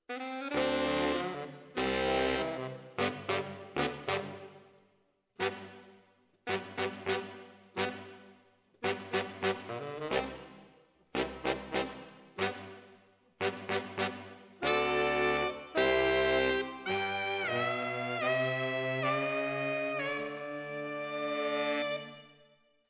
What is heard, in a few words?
ORTF SCHOEPS, piano